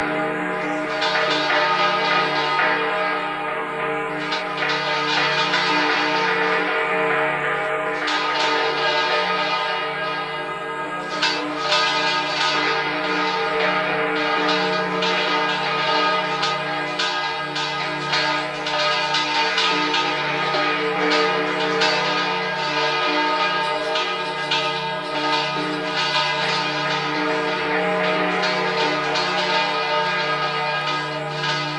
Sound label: Granular Broken Bells - Am 60Bpm; Construction Kits; Ghosthack; SkyRealm; When The Bell Tolls